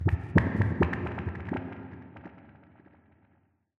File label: amb abstract bubble impact, ambient; Half Life Model Pack